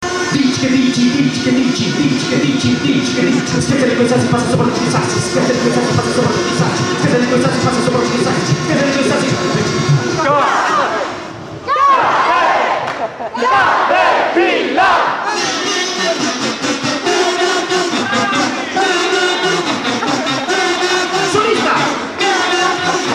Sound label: caterkazoo